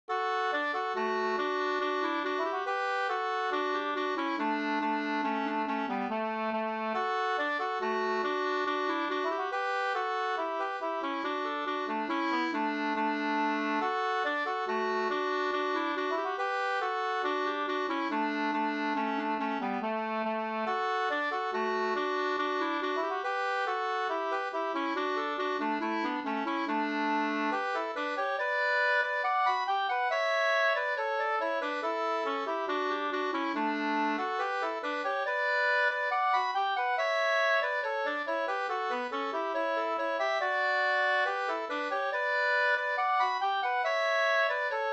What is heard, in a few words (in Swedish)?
schottis; Ronningeschottis av Bjorn Siwertz 2st V1